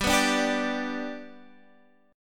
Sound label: G Major G down Guitar Half Step Down